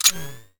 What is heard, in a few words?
Icon Hover; Thanatos